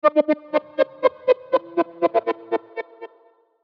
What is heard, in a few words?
Chopper Musical Synth Rhythm; Blastwave; Chopper Sound Effects